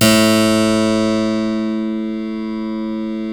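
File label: A1 clavinet right, Keyboards Acoustic; USB Soundscan vol.17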